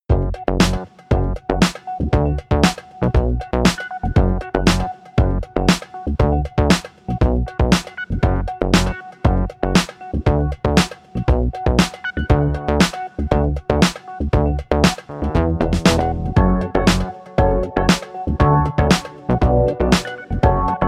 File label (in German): telekom klingelton warteschleifen sound; deutsche telekom